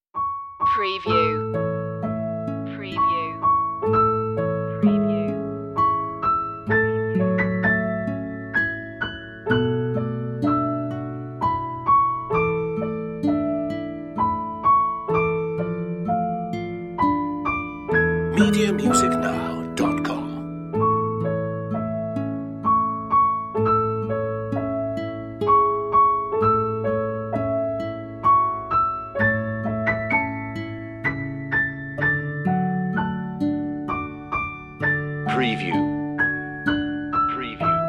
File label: brahms lullaby wiegenlied e flat major zart bewegt; op49